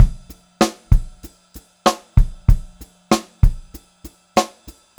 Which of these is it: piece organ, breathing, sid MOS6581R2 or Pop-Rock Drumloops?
Pop-Rock Drumloops